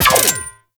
Fire Mech, Lever Action Rifle; Tenno; Sybaris Prime

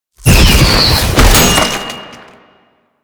Kamikaze Flesh, Proto Infested Volatile Runner; Warframe

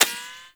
Bullet Impact Ricochet; Warframe

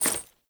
Generic Footstep; Warframe; Uriel

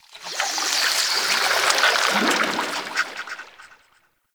Squelch Goop One Off; Warframe; Tau; Ambience

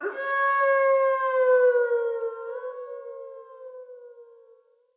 Wolf Cub Trapped Call Distant; Animals; Wolf Cubs; Orengal Wolf Cub A

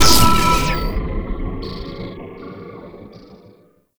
Crystal Shot; Echoes; Warframe; Entrati

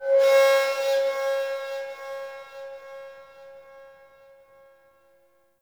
Metal Scrap Texture Med, Corpus Ship ambience; Textures

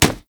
Melee Fist Impact Generic; Fists

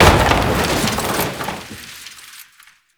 Desk Destroy; Warframe; Entrati; Ambience

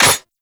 Auto Crossbow Mech; Warframe; Weapons; Tenno

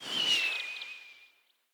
Bow Arrow By; Weapons; Player; Bows; DendritBow

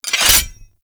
Void Heavy Scythe Equip; Warframe; Duviri; Incarnon Adapters; Melee

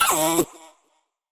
Girl Pain D; Warframe; Zariman; Skitter Girl

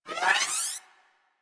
Railgun Charge; Warframe; Corpus; Corpus Railjack; Biped Railgun

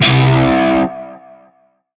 Shawzin Flourish Oct One C; Music; Warframe; Narmer Shawzin

